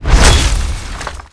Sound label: Weapons Sword